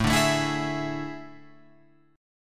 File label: A Major 7th Suspended 4th, Guitar, Open F; AM7sus4; strummed; JGuitar